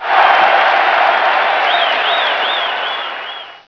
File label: OVATI